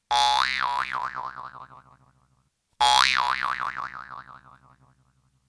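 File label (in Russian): арфа одиночный звук продолжительный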